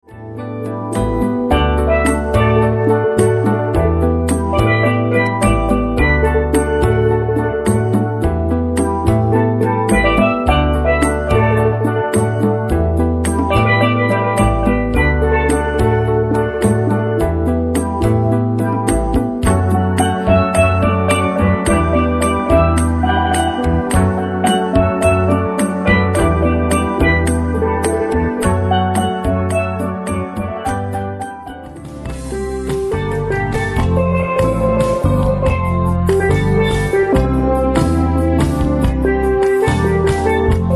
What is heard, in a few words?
Steel Drum; Steel Drum Island Collection